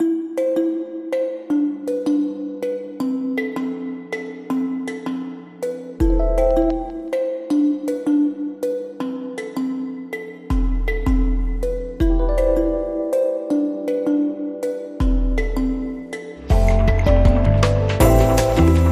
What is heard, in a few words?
Bubbles and Spikes, Underscore